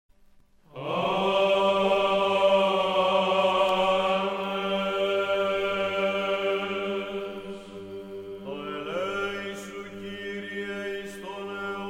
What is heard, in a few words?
Greek, Service of the transfiguration of our saviour